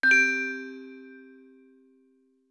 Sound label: Glockenspiel hit; Game Sound Solutions - 8 bits Elements; Match 3 Elements Demo